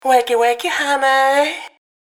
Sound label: Ringtone